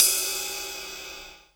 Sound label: RSF DD-30 Ride